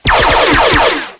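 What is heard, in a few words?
phaser blast; Star Trek Deep Space Nine - Entertainment Utility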